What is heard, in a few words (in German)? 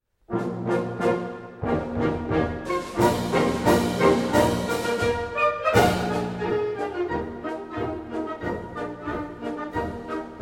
Bauern Polka